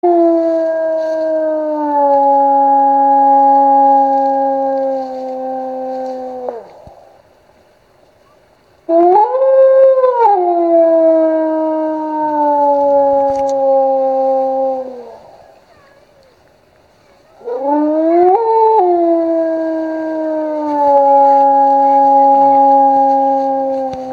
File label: howling